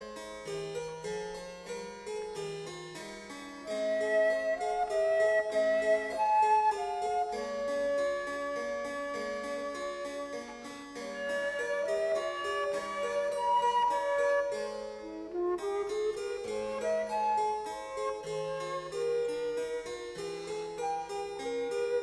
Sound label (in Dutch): Fluitsonate Siciliano